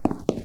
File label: steps platform